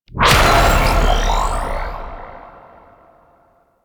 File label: Energy Bounty Cast; DE; Warframe; Weapons; Archwing; Powers; Archwing Healer